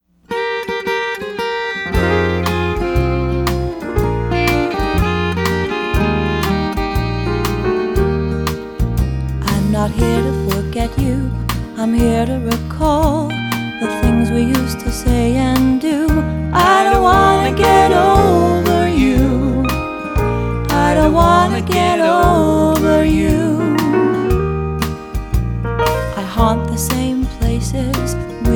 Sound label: Wurlitzer